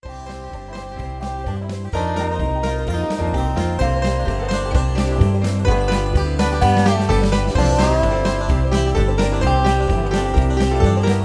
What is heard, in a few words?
Instrumental